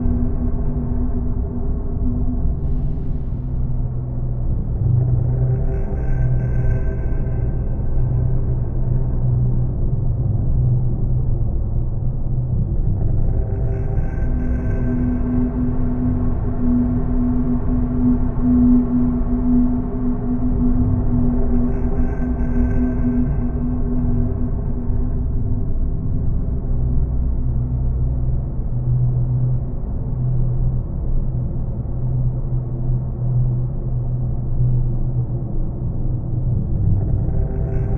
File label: lithoid colossus idle; Stellaris; lithoids